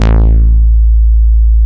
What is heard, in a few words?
DIGI BASS; DIGI BS